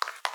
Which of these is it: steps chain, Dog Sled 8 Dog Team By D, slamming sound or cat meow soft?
steps chain